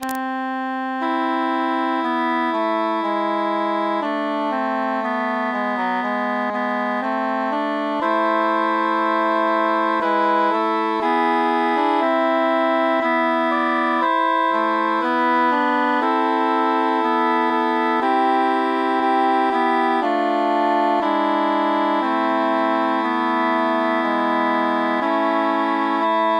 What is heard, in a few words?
bassus mute; weep o mine eyes